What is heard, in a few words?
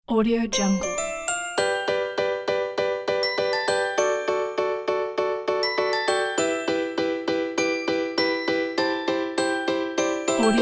happy playful ukulele vlog